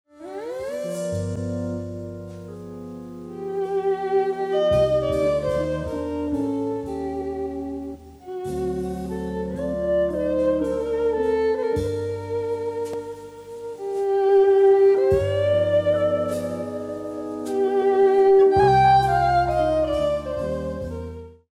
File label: Delsbo Waltz